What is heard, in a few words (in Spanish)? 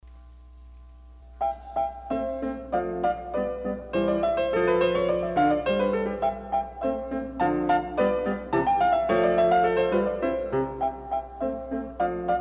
Sonata KV 545 en do mayor Rondo (Allegretto)